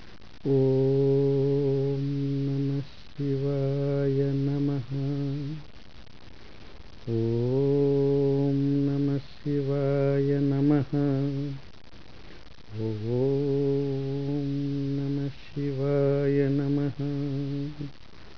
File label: om namah shivaya